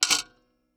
PayPhone

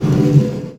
Snare Drum; Percussion Samples

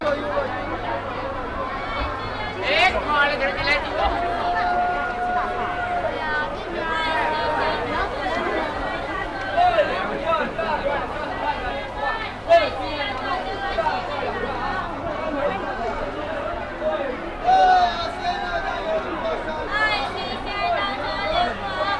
Beijing Street Food Market; Sound Scapes

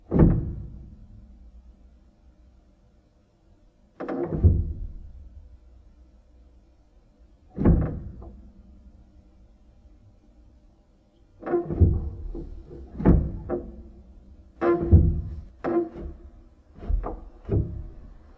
Ped Noise Etc, Piano; Piano Miscellaneous

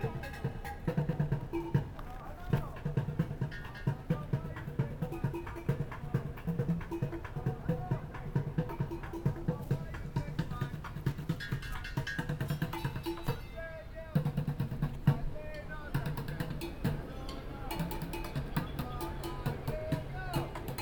Paint Pot Drummer; San Francisco

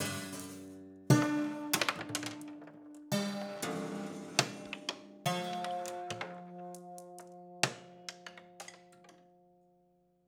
Marble Lazy Bounce, Piano; Piano Destruction

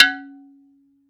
Flower Pot Soft

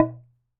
Wooden Bar Strike

